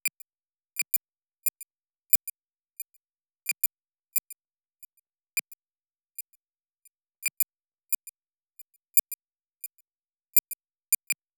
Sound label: data processing, high tech interface sounds; Lesser Vibes